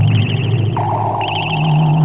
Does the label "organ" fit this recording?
No